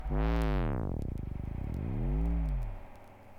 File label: cop pressure single shot bass effect Bm 84, Vinyl sample; instruments; synth